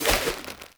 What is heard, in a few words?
Fishing Spear Charge; Weapons; Warframe; Eidolon Fishing Spears